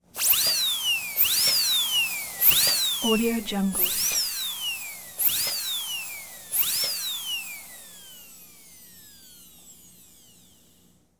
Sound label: dentists drill; prosoundfx